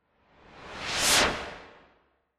Noise Sweep Reverse

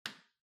Light Switch Box Rocker, Hall Gentle

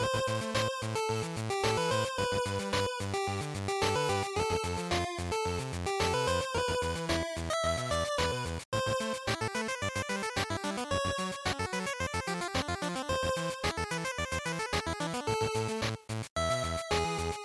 Game Over Loop; GB Racing; bgm

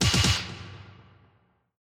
Hit

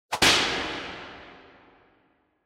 Hit Slap Long Reverb; 打撃 平手打ち; 長 リバーブ

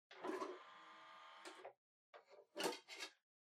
Portable Stereo Far CD Open Take Out

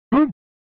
Fighting Shout; SNES

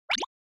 Anime Motion High

